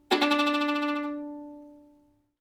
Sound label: viola ricochet; viola-ricochet.wav; OM-SoX hoaencode